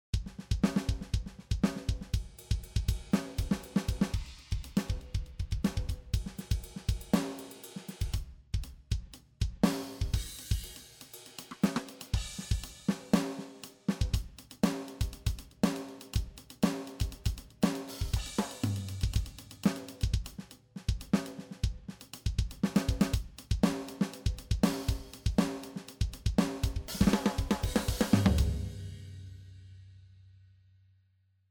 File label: MOOER Drummer Fusion Pattern Stereo Out